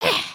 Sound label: stretch, emotes